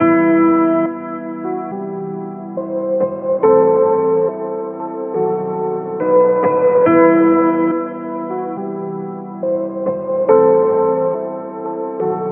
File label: 140 lofi piano, Am; abdication